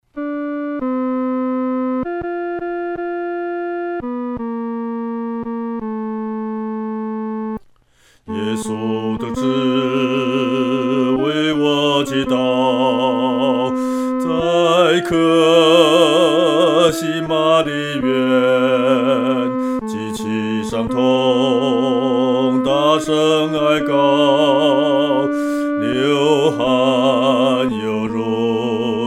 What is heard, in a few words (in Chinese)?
独唱 第二声